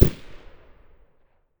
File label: mosin silencer mono far, weapons